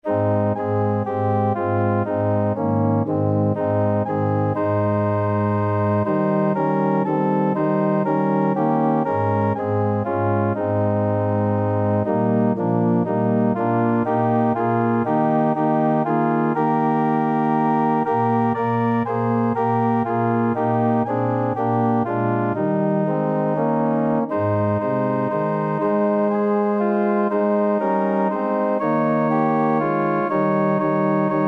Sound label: that will be glory, organ